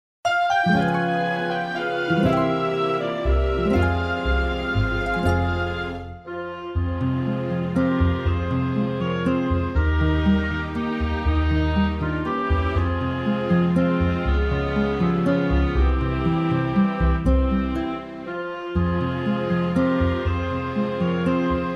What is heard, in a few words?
what child is this instrumental